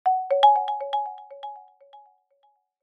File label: multimedia alert system